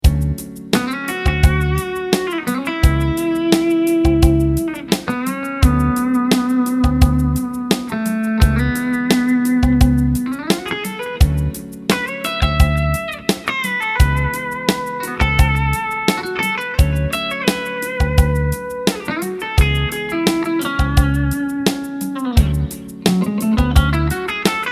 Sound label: sound check